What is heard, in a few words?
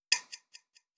timeline node tick